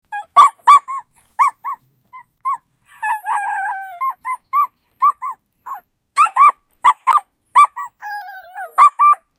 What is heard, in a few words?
happy dog